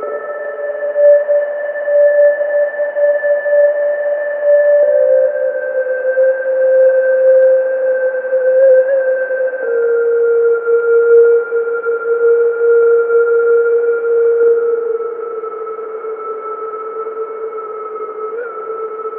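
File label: 100BPM D minor Pad High; BRM Vol2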